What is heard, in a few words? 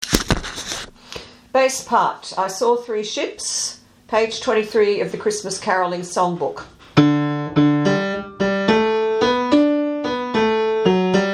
I saw three ships, basses; Blue Book